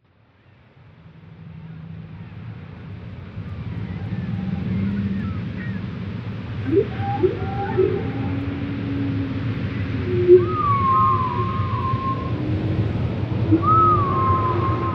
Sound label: Whale Song